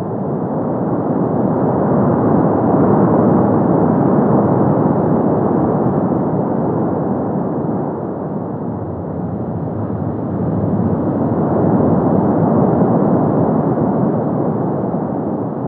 Base Wind Loop

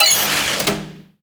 Book Button Up Down

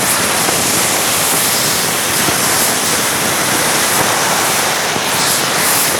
Luminous Urn Loop

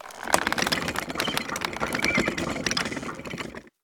Bucket Up

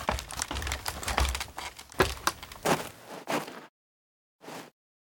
Tree Ladder Off

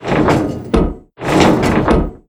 Hatch Jiggle; moul

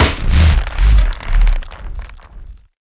Vapor Miner Stalk Hit

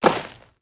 Dirt Walk; moul

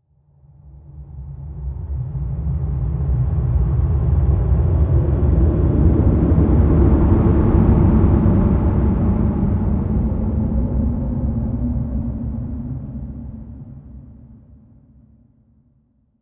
Rnd Abstract Amb

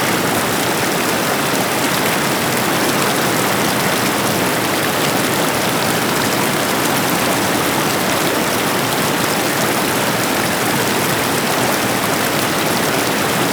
Waterfall Loop

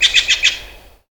Rand Bird

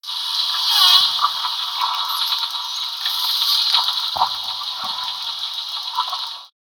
Underwater Creature; moul

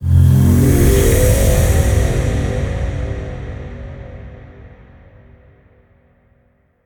Pole Reappear

